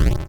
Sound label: rock hit